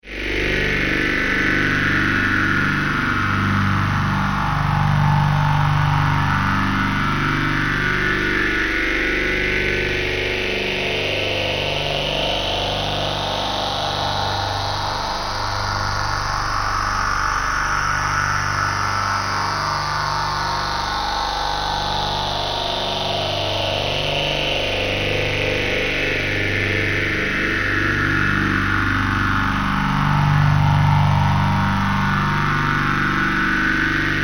Drone, Square, Wave, Phaser, Slow, Scifi; 5.1

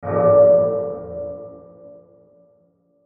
Hit Bell Deep Dark; Sound Design

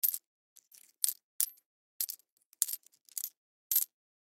Coin Handle Count In Hand Multiple; Foley; Money; Blastwave

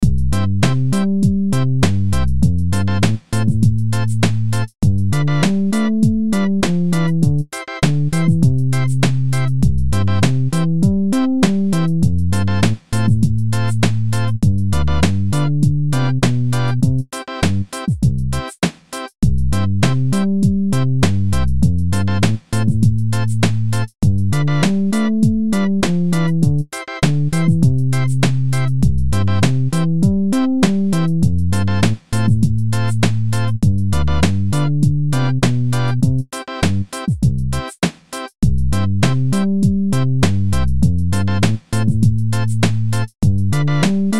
BEAT ORGAN, Beat Organ Bass; Miscellaneous Loops

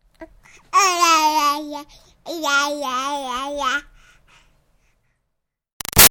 Baby 6 Month Old Talk Ya Ya; Babies (Sound Effects:Voice); Big Room Sound

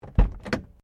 CAR SUBARU LIBERTY INTERIOR DOOR OPEN

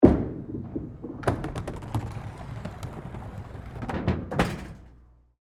Bowling Gutter, Ball, Follow; Sports; BlastWave

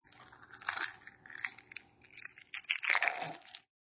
Egg Hatching, Creature being hatched from egg.; Birds

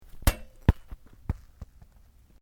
Sport Soccer Hits Goalpost, Soccer ball sounds

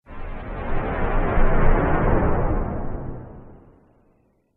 Space Trek Swoosh; Whoosh Sound Effects

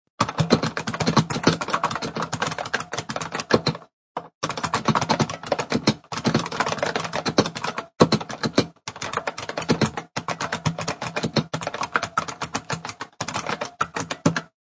Fast hard typing on a computer keyboard; Part of Typing (Sound Effects:Offices)

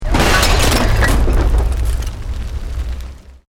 Explosion Medium Brick Wood Glass

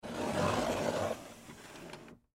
Chest Drawer Close, Wood; Foley Chest Drawer Close Wood; Blastwave

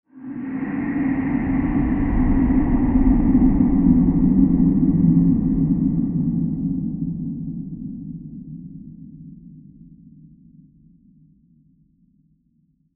Diffuse And Breathy Horror Transition; Scary Soundscapes

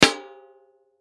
Impact Tin Large Empty Hit Hard; Metal; Sound Effects:Materials; Blastwave

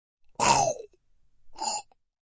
Choke Gag; A choking gag.; Gagging; Voice

